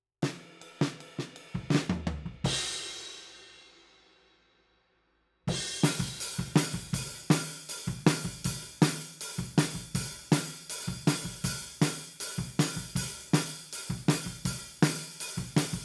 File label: Overheads, 160 BPM